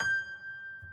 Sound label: piano sus